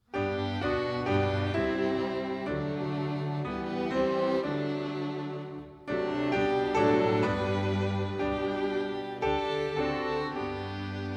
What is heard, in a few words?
hymn vocal and instrumental